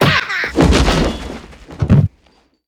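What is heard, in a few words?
death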